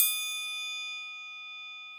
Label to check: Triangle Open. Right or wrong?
Right